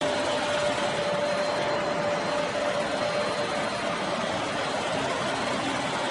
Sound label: vacuum cleaner